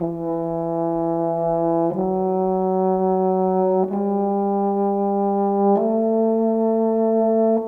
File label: Horn, Orchestral; Sonic Foundry (Sony Creative Software); Orchestral VOL-2